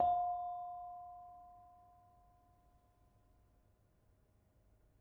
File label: Bonang Barung soft, gamelan